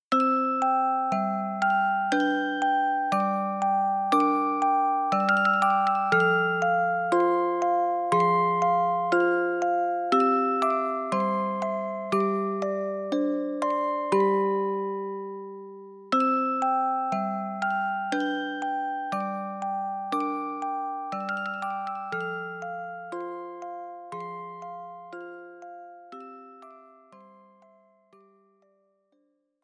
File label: Music Box; tales of eternia original soundtrack